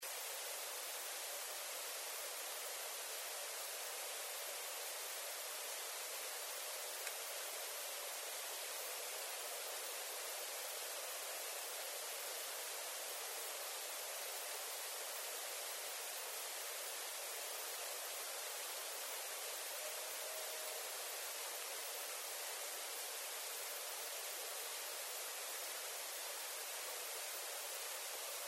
Coyotes, Night Sounds; Canis latrans

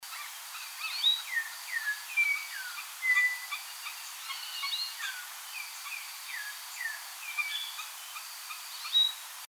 Altamira Oriole

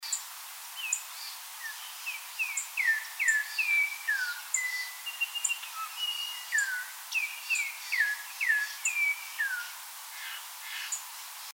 Altamira Oriole

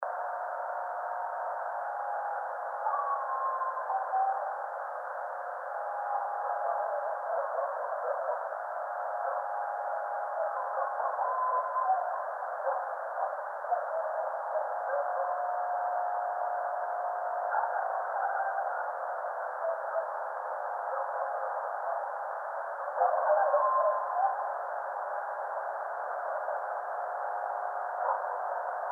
Howl, bigfoot

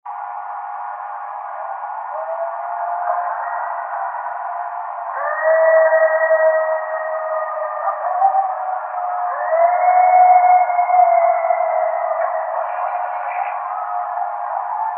Illinois Howl

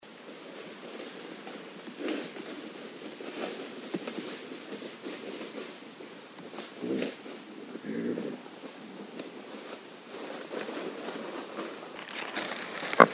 Raccoon; Northern Raccoon (Procyon lotor); North America

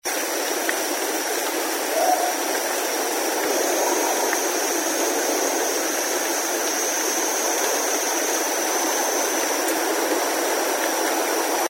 North Lake Whoop

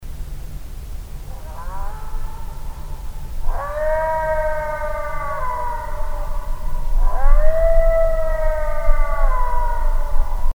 Illinois Howl unfiltered

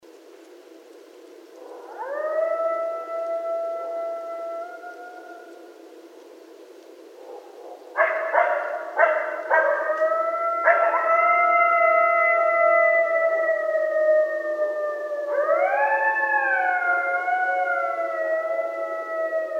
Coyotes, Night Sounds; Canis latrans

